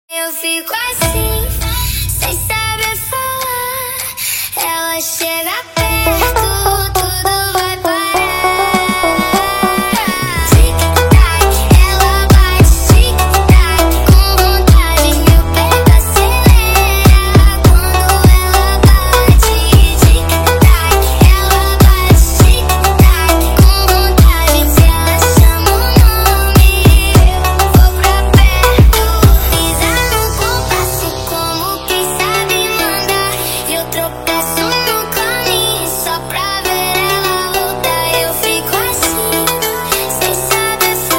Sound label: Montagem Speed Up